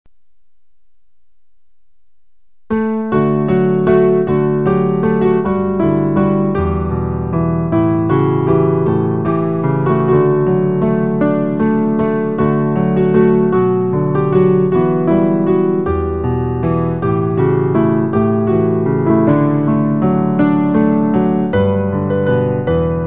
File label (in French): le sauveur attend piano; recueil Louez Dieu